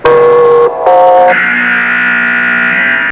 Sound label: claxon